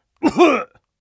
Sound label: die; metropolice; hl2